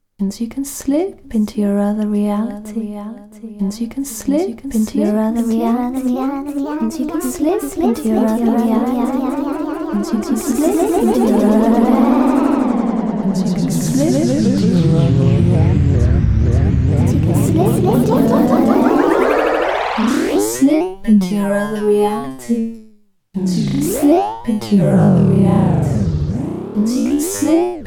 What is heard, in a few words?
delay tweakin